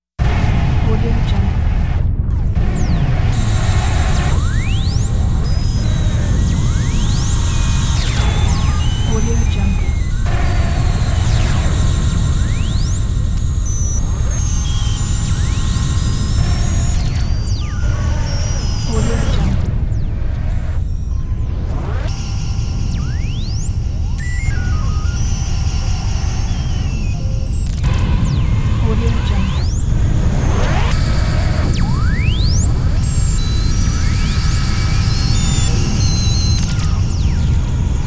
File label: sci fi machine